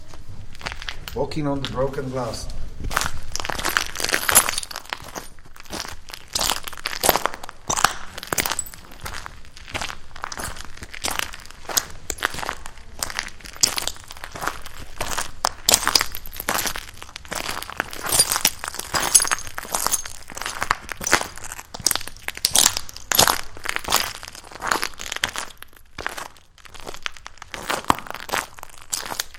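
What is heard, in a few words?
walking on broken glass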